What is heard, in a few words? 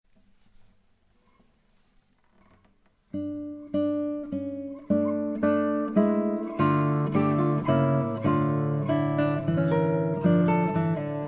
Classical Guitar; se electronics vr2; میکروفون استودیویی و حرفه ای اس ای الکترونیکس VR2